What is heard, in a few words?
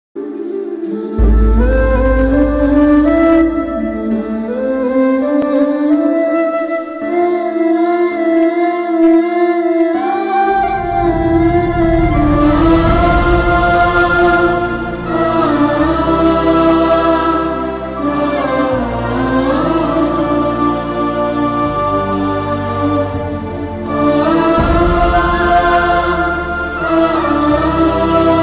emotional bgm; bhajarangi